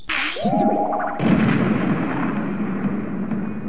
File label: Explosion; Bucky